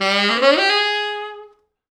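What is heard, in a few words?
tenor sax runup, G#3; East West; Quantum Leap Horns Sax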